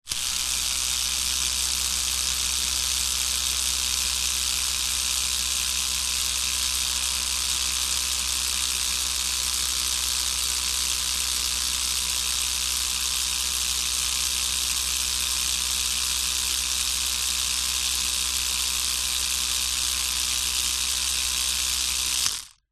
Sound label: household electric toothbrush switch on run med long off